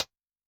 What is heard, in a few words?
Snare, Yamaha DTXpress-II